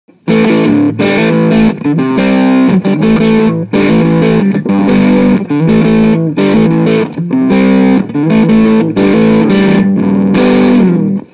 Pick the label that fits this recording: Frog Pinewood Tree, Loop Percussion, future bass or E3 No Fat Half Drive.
E3 No Fat Half Drive